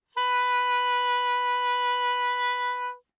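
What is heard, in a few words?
Soprano Saxophone vibrato ff B4; Woodwinds; Bb Soprano Saxophone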